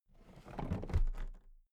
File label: toolbox put down regular